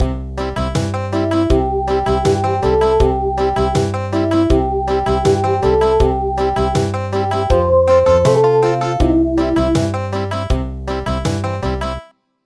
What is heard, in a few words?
Country